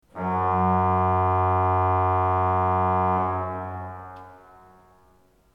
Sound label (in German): ton, orgel